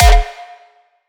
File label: raw kick